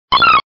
Grito de Cleffa

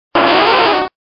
Grito de Shellder

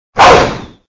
Grito de Boltund

